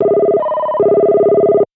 Call Ringback; Skype Sounds

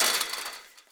fence step; Golden Crayon Sounds

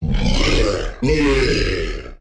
giant spawn; Original TK Sounds

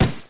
wall sand; Golden Crayon Sounds

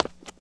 concrete step; Golden Crayon Sounds

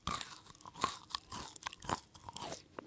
eat; Golden Crayon Sounds

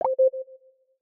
home announcement recording begin; iOS Sounds

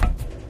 plastic step; Golden Crayon Sounds

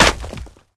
concrete land; Golden Crayon Sounds

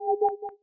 Lock Screen Failed To Authenticate; mono; iOS Sounds

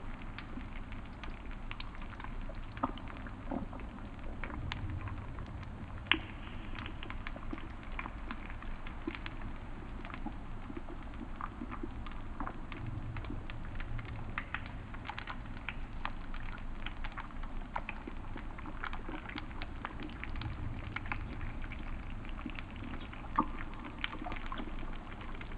cave; Golden Crayon Sounds

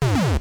Secure Character; Salem's Sound Schemes; mario

